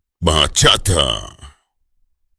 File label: bachata dj